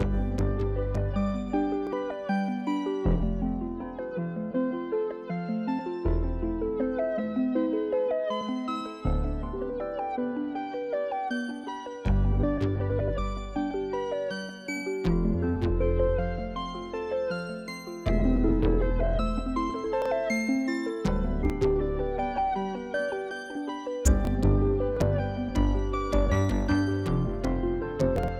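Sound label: Spooked Loop 80bpm; Thrutrain; jenny leclue detectiv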